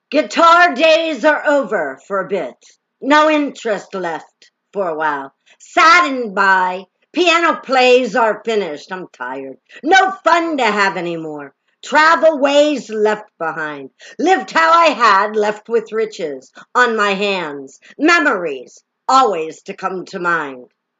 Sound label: Guitar